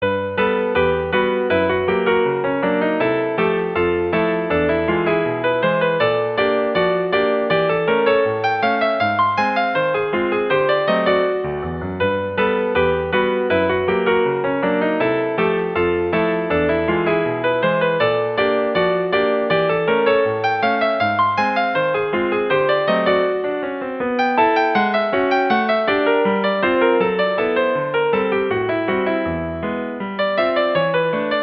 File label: Ragtime